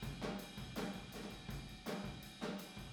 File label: Boogaloo Chorus Room ST, 82 BPM